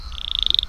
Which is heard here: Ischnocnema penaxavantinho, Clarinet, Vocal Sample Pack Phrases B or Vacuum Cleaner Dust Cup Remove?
Ischnocnema penaxavantinho